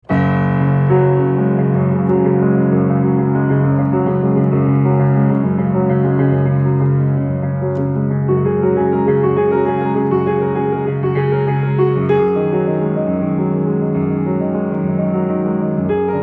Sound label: piano